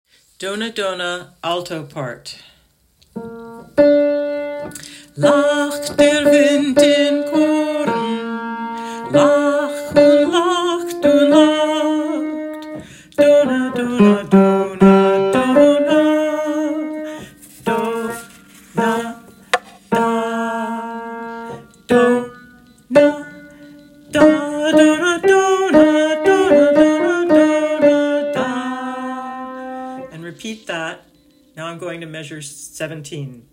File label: alto